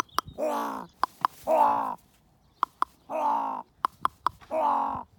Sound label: Gwah